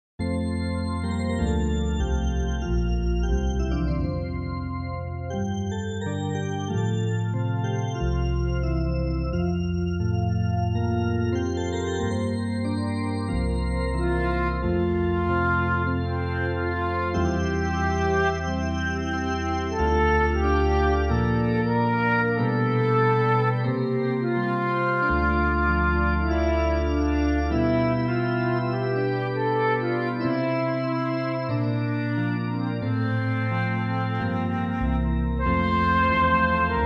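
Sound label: play flute reference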